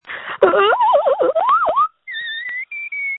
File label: screams